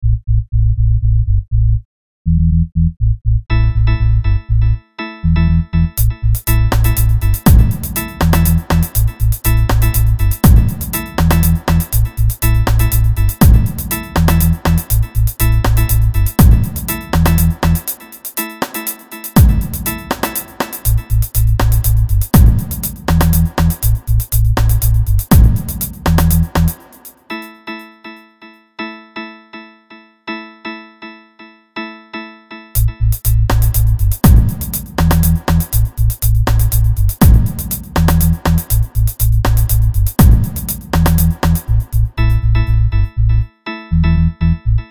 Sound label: messiah instrumental